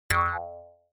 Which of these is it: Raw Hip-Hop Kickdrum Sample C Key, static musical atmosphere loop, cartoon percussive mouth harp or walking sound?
cartoon percussive mouth harp